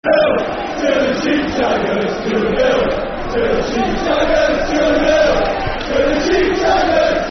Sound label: Football Chants; Cardiff City; fanchants-com